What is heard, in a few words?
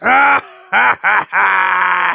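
laugh evil, German; Demoman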